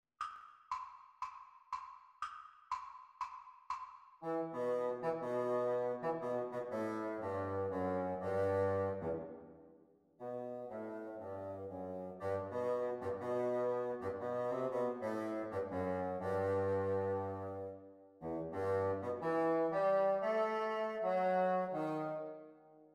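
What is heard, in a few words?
bassoon duets practice speed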